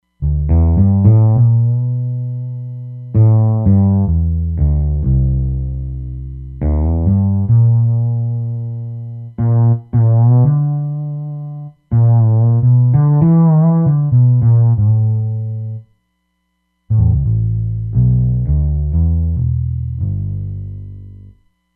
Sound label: Rich Fretless; Roland Fantom XR